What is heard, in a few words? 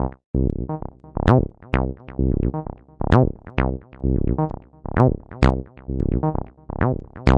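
tb303 acidline, 130 bpm; SYNTH AND ELECTRONIC LOOPS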